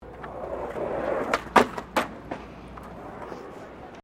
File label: SKATEBOARD FLAT JUMP; Skateboarding; Sports